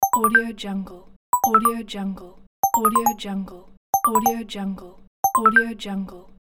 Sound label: message alert notifcation